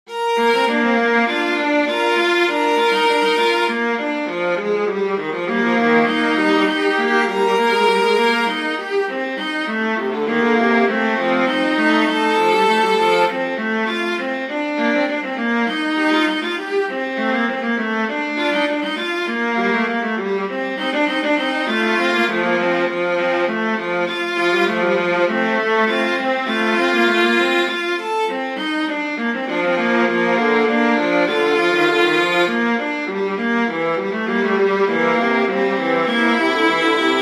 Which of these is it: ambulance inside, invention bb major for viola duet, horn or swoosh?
invention bb major for viola duet